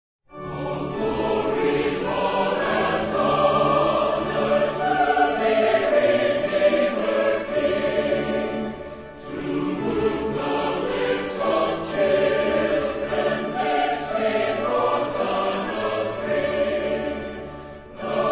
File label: All glory, laud and honour Choir